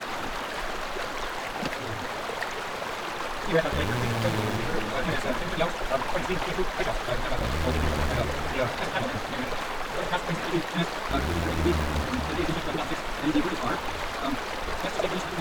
nature sounds & synth

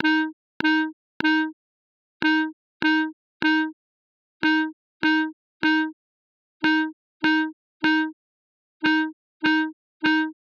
Clarinet vs Clarinet

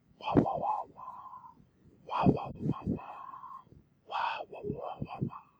wawawawa

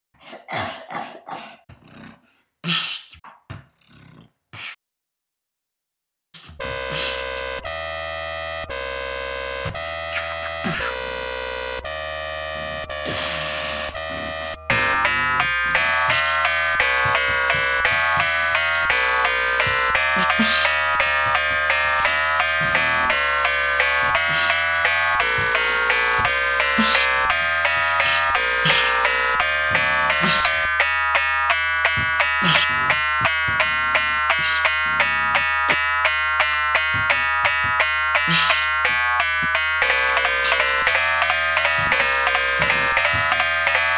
Dubstep

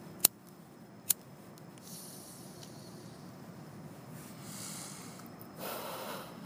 Smoking

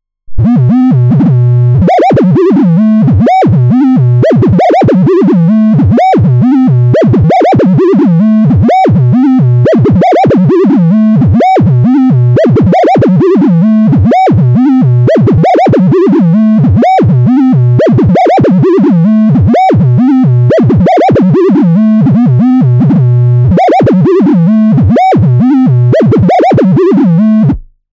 rhythm

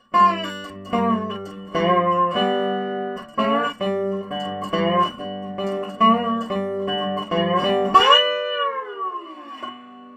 dobro through banjo minus piezo

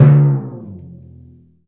timpani

